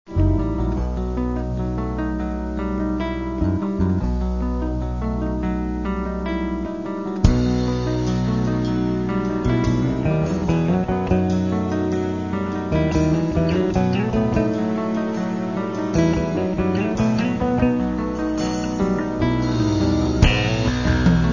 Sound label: fusion orchestra